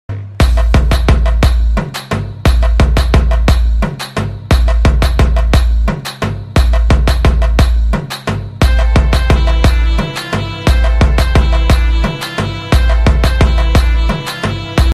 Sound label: fusion violin remix fusion violin ringtone; kalasamithi